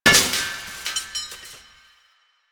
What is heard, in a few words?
Chair Stack Destroy; Warframe; Ambience; Nineteen Ninety Nine